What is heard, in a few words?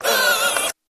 Door Outer Open, Damper, Wavering